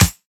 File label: clicky snare drum f key; snare drum samples